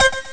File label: dist guitar; Amiga Soundtracker Sample Packs